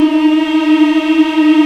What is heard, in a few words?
African Mm p; Roland LCDP11 Africa VOL-1